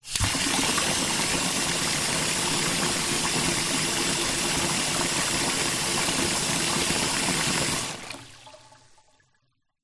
household glass bowl fill water from kitchen faucet tap sink; zapsplat